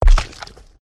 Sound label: walk, footsteps